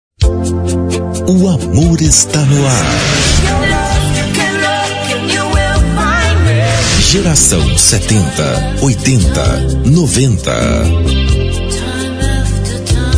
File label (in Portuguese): Vinheta; Geracao 70 80 90; Brega; Zuacao